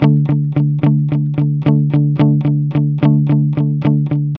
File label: ROCK D 110, Vintage Blues Guitar; USB Soundscan vol.22; AKAI